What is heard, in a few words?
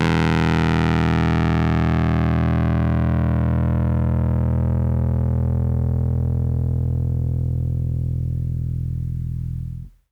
RHODES FUZZ; PlatinumPhattCD2; E-MU Producer Series Vol. 8 – Platinum Phatt